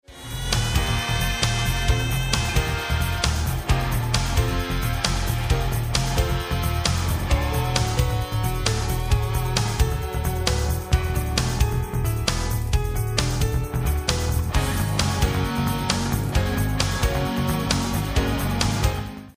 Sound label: bassline instrumental